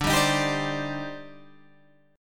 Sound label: D sharp Major 13th, D, guitar chord